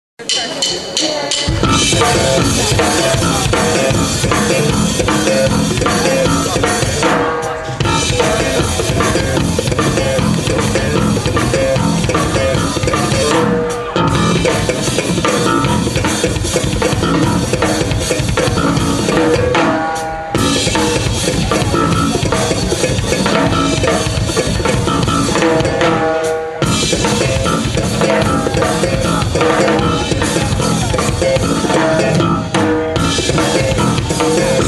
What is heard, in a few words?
bass n drum